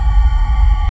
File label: powerful explosion; cyan